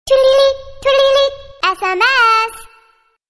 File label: sweety